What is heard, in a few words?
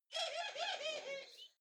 hen laugh; natur-tiere; huehner